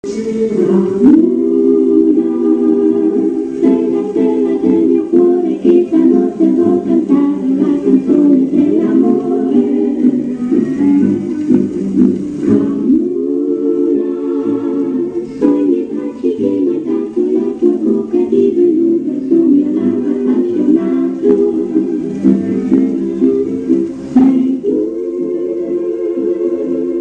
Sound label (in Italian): Tango, Orchestra